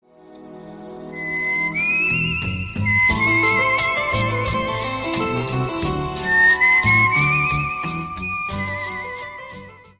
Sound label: late afternoon radio